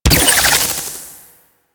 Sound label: Respawn; CTF Cephalon; Warframe; Ambience; PVP